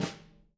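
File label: jazz snares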